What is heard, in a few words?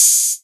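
Hat Open, Novation Drumstation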